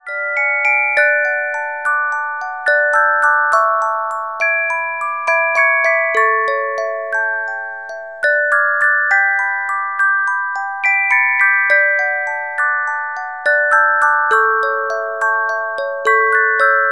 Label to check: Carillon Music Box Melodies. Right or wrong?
Right